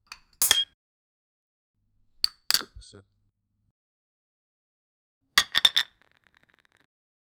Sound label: soda bottle opening sound